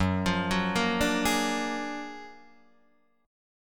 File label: Gb Augmented Major 9th, down, Guitar, Open D